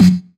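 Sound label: 909 SD amp, snare drum; Goldbaby Tape808 & Tape909; The Tape 909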